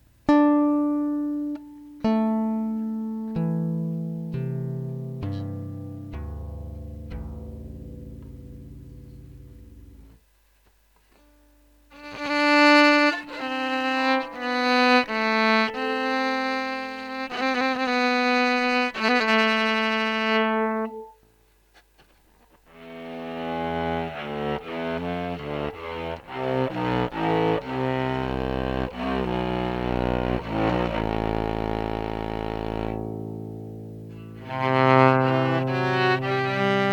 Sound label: sample gamba to mixer, violin